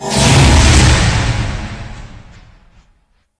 living bomb; csgo; wcs go

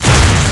air shock; csgo; wcs go

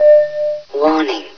bell warning; csgo; mapchooser; hl1

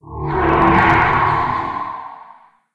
focused mind; csgo; wcs go

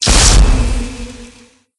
arcane missile

